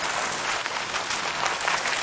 light rolling

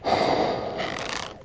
accordion empty push

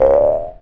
trampoline

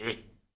pig hi-hat

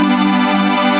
dis major left

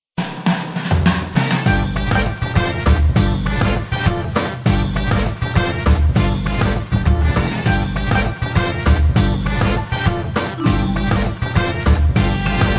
funky theme

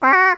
piano d

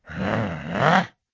bigbrother awakens

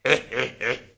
piglette oink

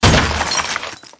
stalaktite break

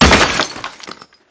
stalaktite break

